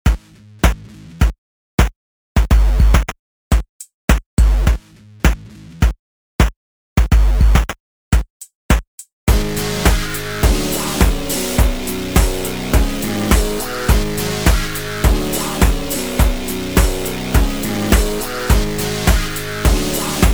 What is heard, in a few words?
Synthfield